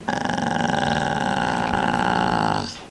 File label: wolf grunt; minecraft; technic